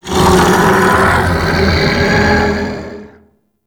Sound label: Chain Reaction Beam Vocal; Warframe; Qorvex